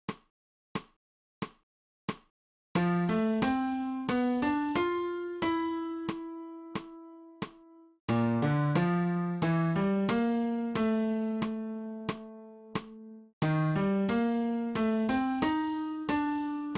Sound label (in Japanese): 新フレットレス